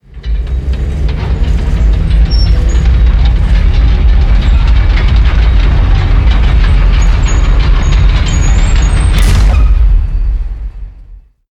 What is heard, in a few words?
Pillar Dry